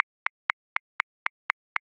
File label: Hip Hop Late 8ths; Grooves; Ableton Live 12 Lite; Core Library